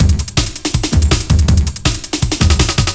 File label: Bullet 162, breaks; minihalfs